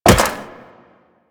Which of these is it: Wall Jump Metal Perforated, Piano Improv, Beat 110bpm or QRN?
Wall Jump Metal Perforated